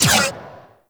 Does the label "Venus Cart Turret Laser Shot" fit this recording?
Yes